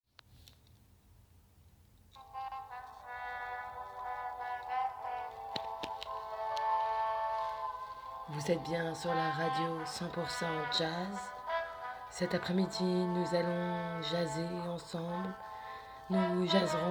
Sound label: Radio jazz